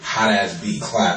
hot beat; hip hop

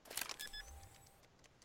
Bomb Plant Beep

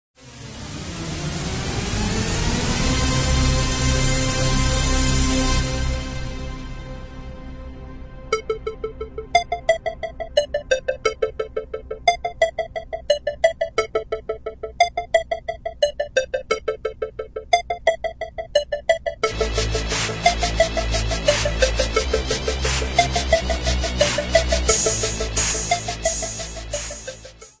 hard hip hop rap instrumental beat

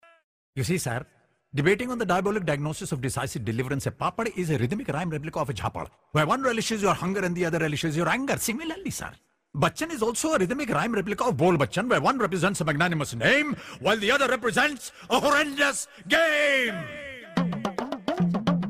flute; alternative

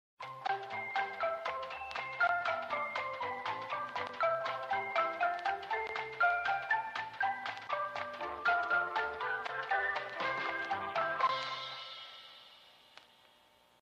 night start jingle

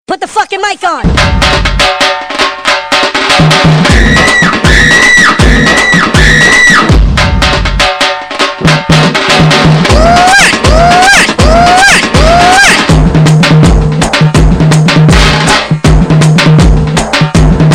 indian mixture

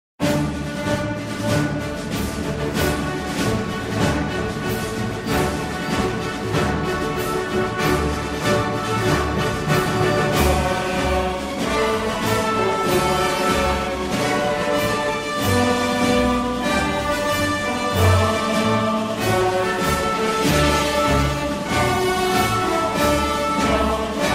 ringtone

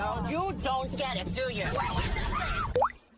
Bloop

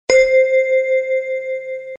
Notificaition pling

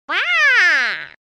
Psyduck

